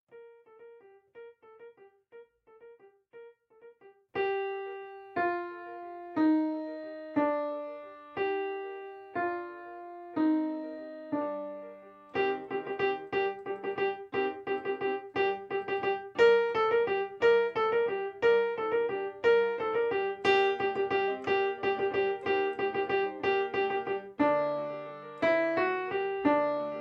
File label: bells alto